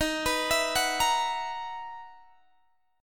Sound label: B Major 11th D sharp, guitar